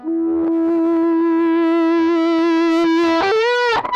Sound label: GTR, GUITAR FX